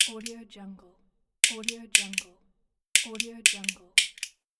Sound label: the lighter click